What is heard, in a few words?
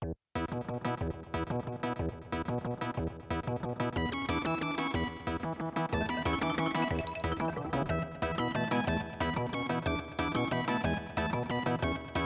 Unused song; Addams Family